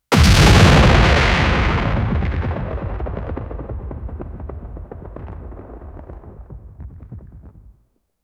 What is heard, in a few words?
heavy artillery, shockwave